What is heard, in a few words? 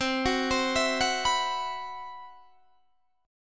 C Major 7th Flat 5th C, Guitar Open D